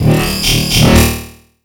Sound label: Criket Fx Fill, Cricket